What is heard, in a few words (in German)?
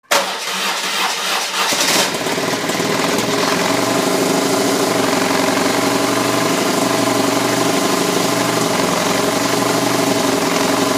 BMW R1100GS Erster Start